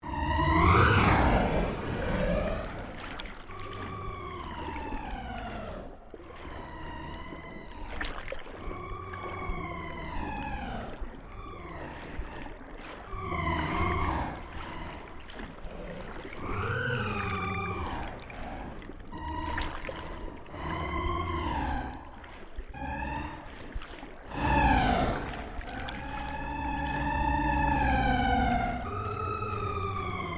CYBER WHALE WHALESONG, Created creatures, vocalisations and pretend speech; Alien Sound Effects